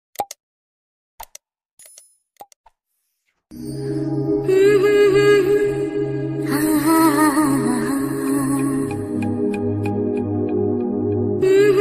best love ringtone bgm ringtone; Rangam movie

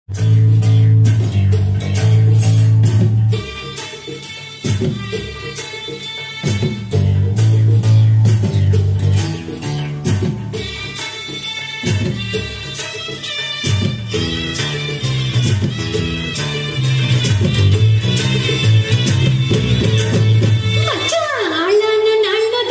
bgm electronic

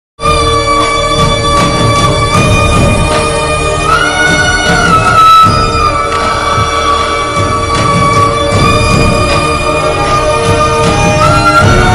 kannada movie heart touching bgm; bhajarangi 2; shivanna

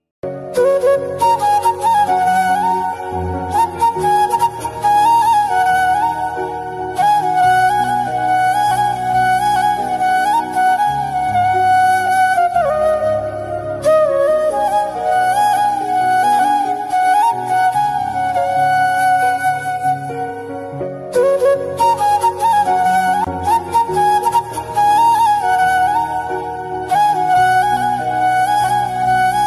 bansuri flute ringtone krishna flute ringtone; mobile ringtone bhakti ringtone

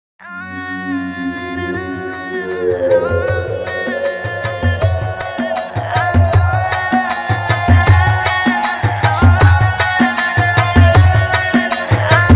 trance ringtone

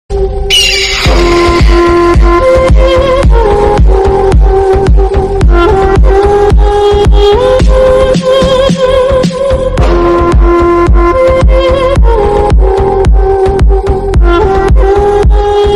eagle ringtone english song

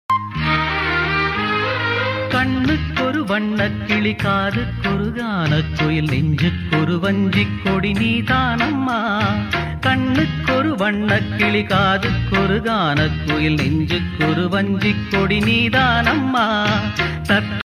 tamil old music tamil song

